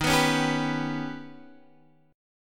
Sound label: D sharp Augmented 7th D sharp Guitar Half Step Down